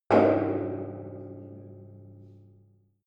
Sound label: metal impact tank inside